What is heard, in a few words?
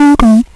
SWAP ON; Escape Beyond 2001